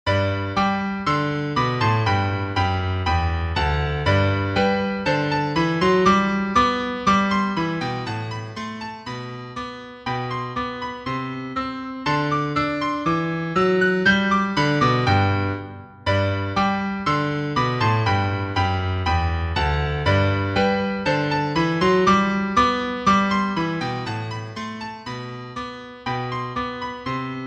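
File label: Gavotte, solo piano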